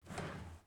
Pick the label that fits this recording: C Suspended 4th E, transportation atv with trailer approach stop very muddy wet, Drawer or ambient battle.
Drawer